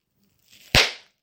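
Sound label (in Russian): этот звук раздался когда я выпил упаковку сока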